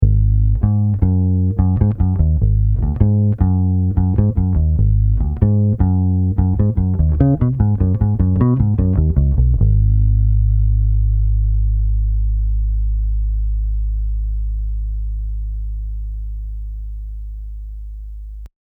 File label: Neck Pickup Solo